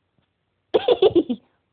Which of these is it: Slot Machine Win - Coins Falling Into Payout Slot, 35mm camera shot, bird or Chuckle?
Chuckle